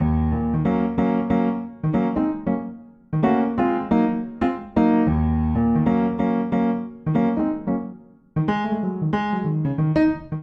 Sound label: Phrase Blues Eb Minor 92bpm, Upright Piano; Blues Sky; Upright Piano by Spitfire Audio; Ableton; Factory Packs